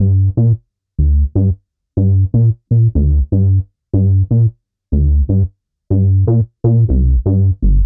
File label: BASSLINE 120 Gm